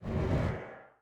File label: swish; smite; god thanatos